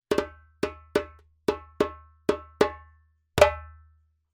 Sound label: djembe; djembebog oevelse